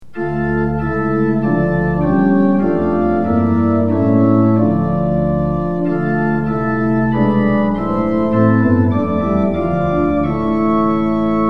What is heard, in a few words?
tune; hymns; lillenas